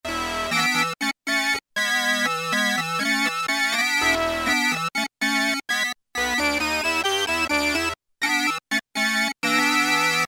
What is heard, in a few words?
Farfisa ish, Roland JX-3P